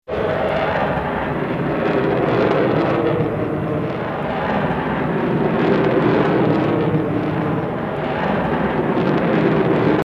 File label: Bullet Time; FXHome; Bullet and Gun